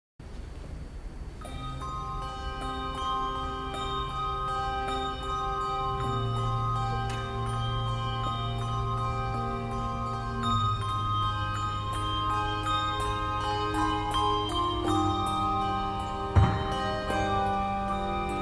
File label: Nocturne in C Minor, handbells